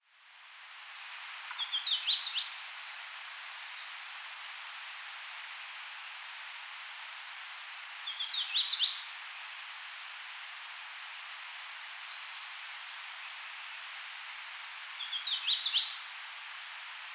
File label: Hooded Warbler, Setophaga citrina